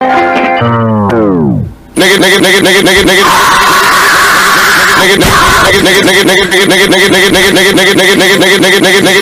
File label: awesome beats